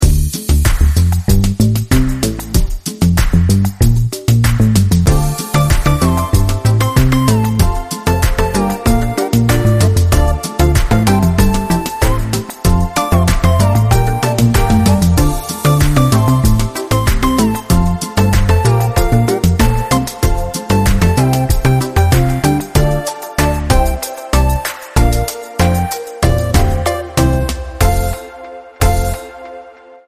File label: Tropical Vibes